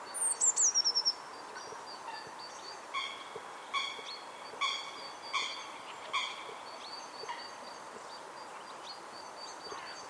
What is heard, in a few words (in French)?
foulque macroule mesange